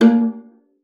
A#3, VIOLA FINGER; Xsample VOL-5

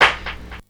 Clap, Drum One Shots; AMG - Coldcut's Kleptomania VOL-1

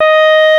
clarinet D#5; East West; Global Sounds

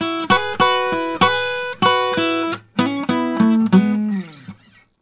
Blues Guitar lick; ILIO; Fretworks; 96 HIRUM LICK

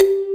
MINI DRUMS; Pro-Rec - Synthsonic; TRINITY

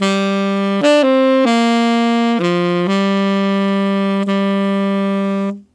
Tenor Country Waltz in G min, Tenor Sax Loops; Sonic Foundry (Sony Creative Software); Jazz Solos and Sections

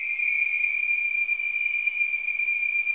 WHIS, whistling

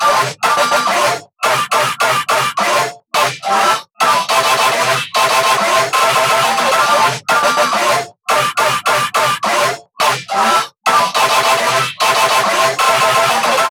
Ahh Goofy Cooler Bass

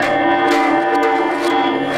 Void Perc; The Void; Processed Drumkits

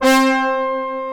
VOY8 BRASS, VOYETRA 8; Greytsounds VOL-1

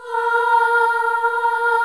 Boy Choir A#4

